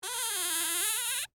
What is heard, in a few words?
Door Squeak Wood, Foley, Door, Squeak, Wood; Metal Door